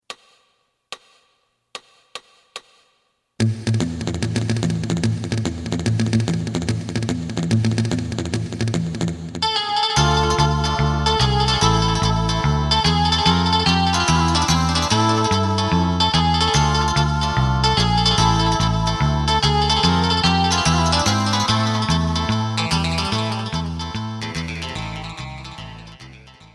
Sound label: drum